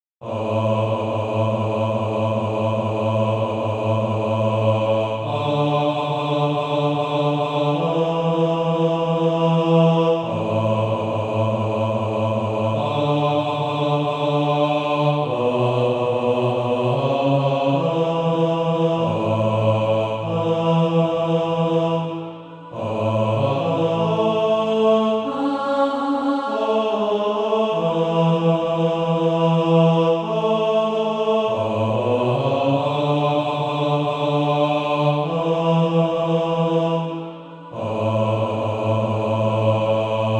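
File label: bass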